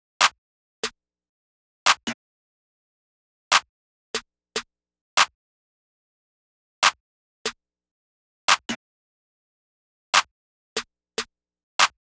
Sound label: Clap 145BPM Layered Bouncy